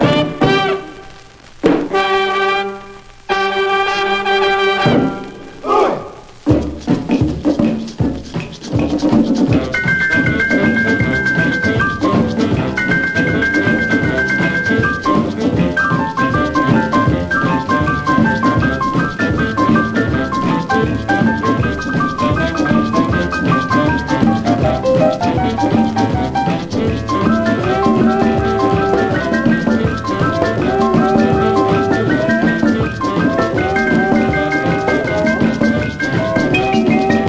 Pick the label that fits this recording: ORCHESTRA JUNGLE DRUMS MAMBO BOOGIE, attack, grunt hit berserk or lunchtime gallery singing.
ORCHESTRA JUNGLE DRUMS MAMBO BOOGIE